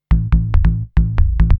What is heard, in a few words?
TECHNO BASS 140 A